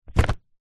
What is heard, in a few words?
office plastic document folder wallet set down surface